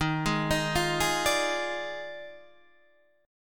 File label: D sharp Major 7th Suspended 2nd Suspended 4th, Guitar, Open D